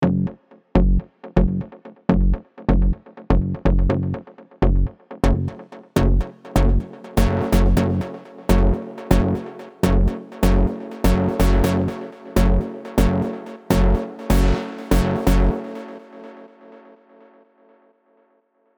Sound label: Group compression and automation